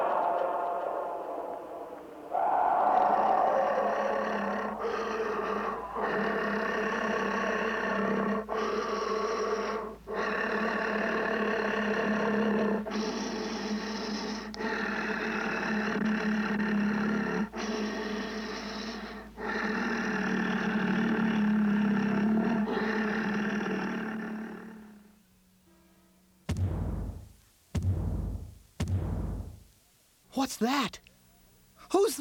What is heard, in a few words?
horse doom; halloween